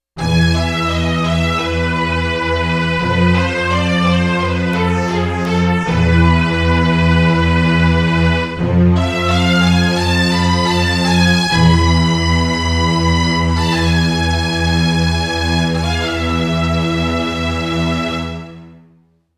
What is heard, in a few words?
Strings L R